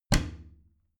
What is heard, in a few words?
bathroom cabinet warped close heavy